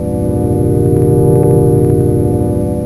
alien hum; quake2; fox

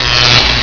voltage; quake2

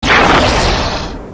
blast; quake2; weapons

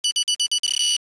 beep, weapons; quake2

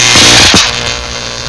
zap; quake2

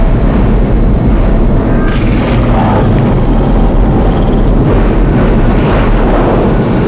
rapid; quake2

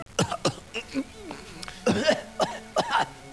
cough; quake2

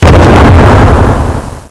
nuke blast; quake2; weapons

